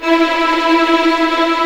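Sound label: E4 tremolo f violin right, violins tremolo; East West; The Ultimate String Collection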